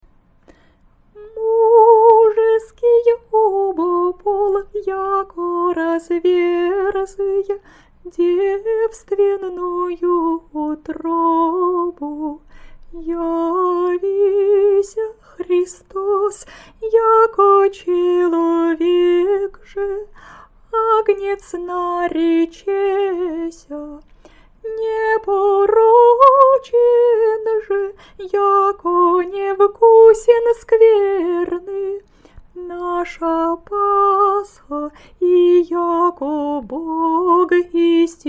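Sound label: easter canon byzantine slower, singing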